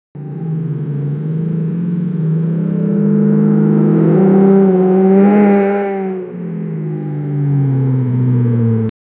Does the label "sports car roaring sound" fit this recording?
Yes